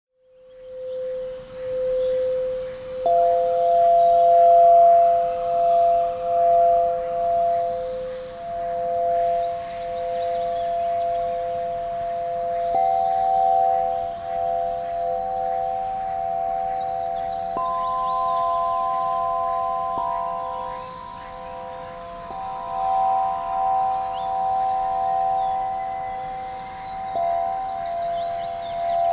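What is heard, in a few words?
Chakra C Focus, crystal harp; Kristallharfe; Regein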